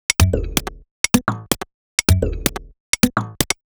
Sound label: Top 127bpm, House Drumloops; Zero-G House Fabrik Drumloops; ZG Drumloops